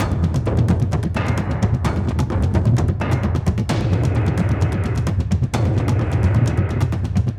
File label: Combat Mixed